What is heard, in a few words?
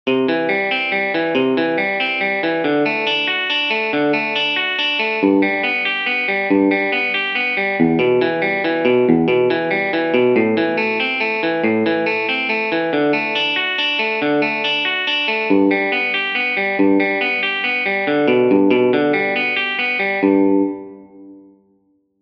arpeggio